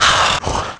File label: gasp